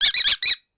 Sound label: Squeeky Ball Toy